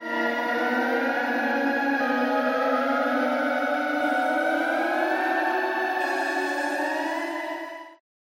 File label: ATMO Spectral Ghosts; Synthractive; syntheway